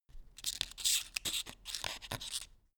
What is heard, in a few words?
quill write